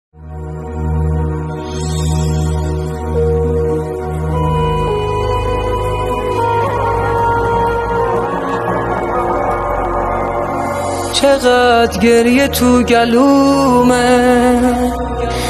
مداحی استودیویی

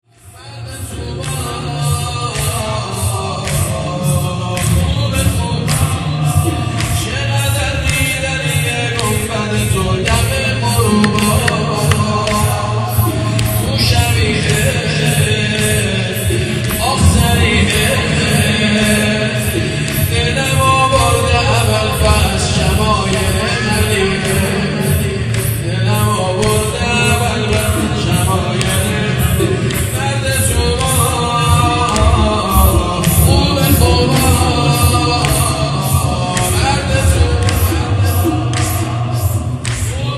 مداحی; احساسی

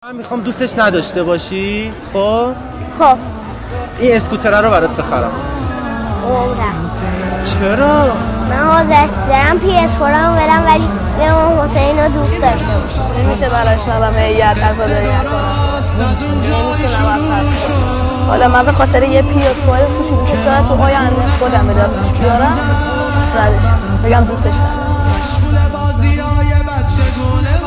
مداحی

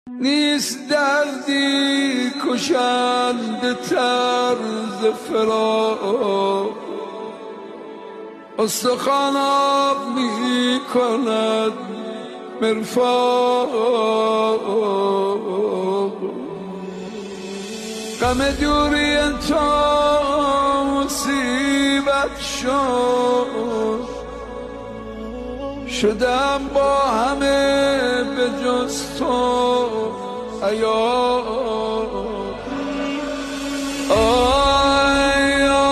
مداحی احساسی استودیویی

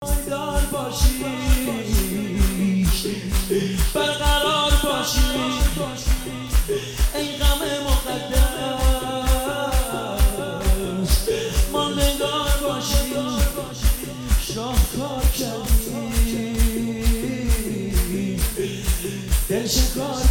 مداحی

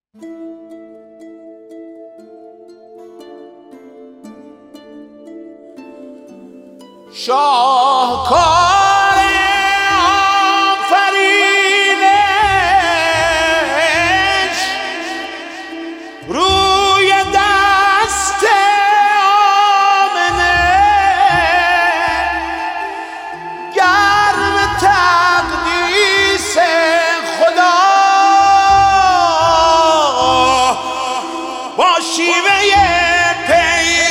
نماهنگ

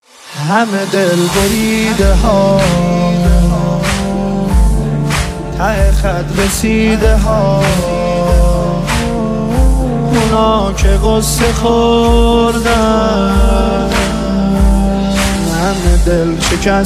مداحی

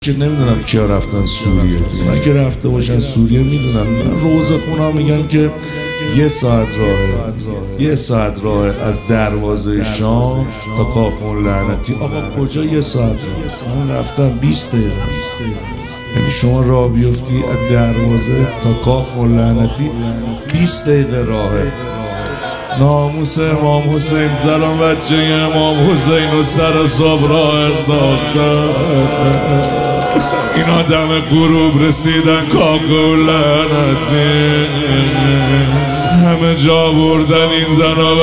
روضه; دروازه شام

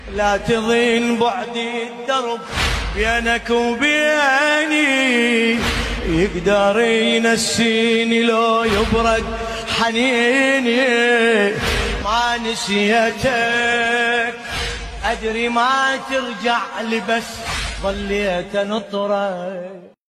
مداحی عربی

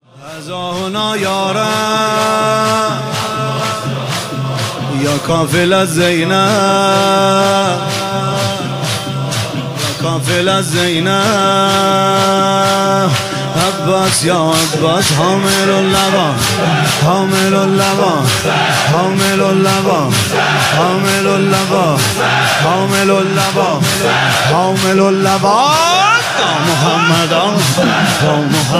مداحی

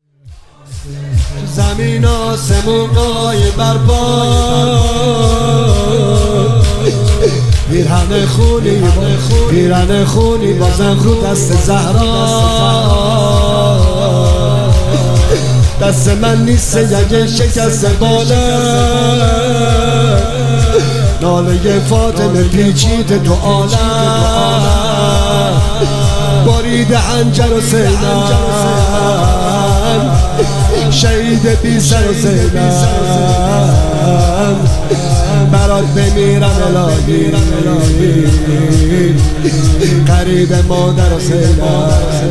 مداحی

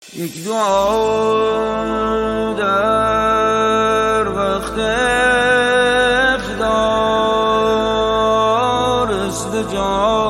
نماهنگ

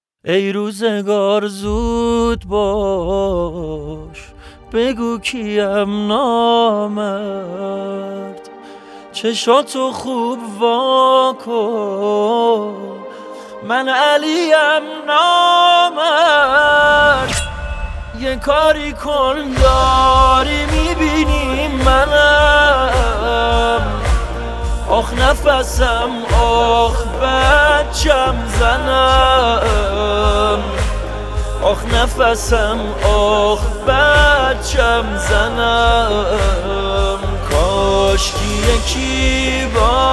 مداحی استودیویی

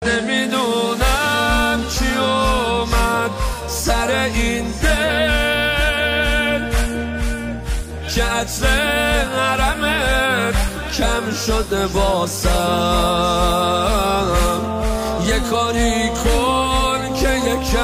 مداحی

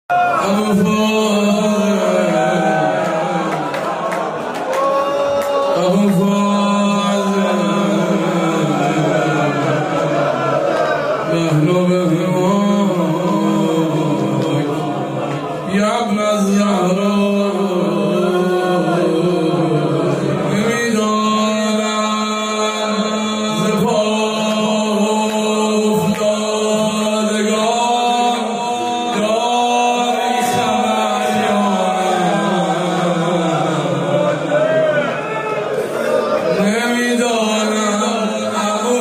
نوحه

